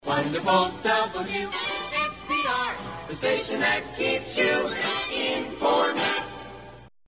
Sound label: jingle; wspr